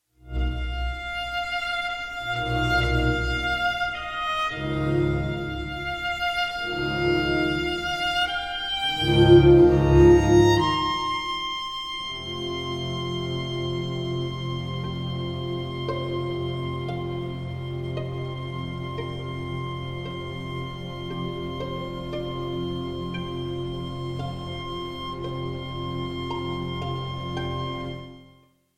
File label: harpsichord concerto; Distler; op 14